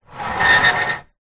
plastic tray move scrape on wooden surface with screech; foley